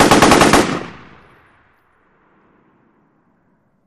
Machine Gun Sngl Burst; bursts

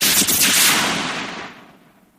Hand Phaser Sngl Sho

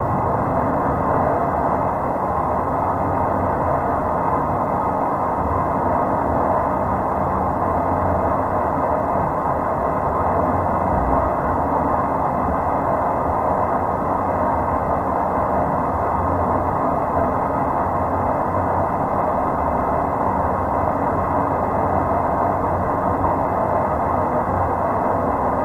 Hydraulic Brakes, factory

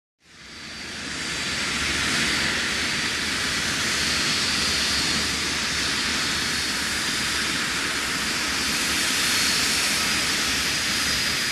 Jet Passg Take Off, 727

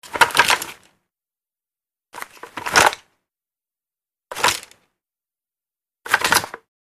Gun Sudden Moves X4, handgun mvmt

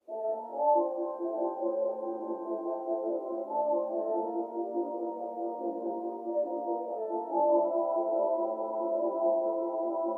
Mx Elevator Latin Fr

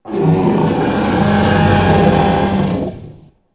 Dinosaur Growl Roar

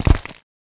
Bell Pepper Crush Bon; punches kicks hits bones

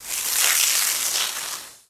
Water Hose Spraying A Tree, Soft Start

